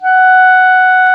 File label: Clarinet; Roland LCDP04; 90 s Sample CDs; Clar Cls